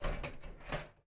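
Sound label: Server battery Open; miside demo windows gamerip 2023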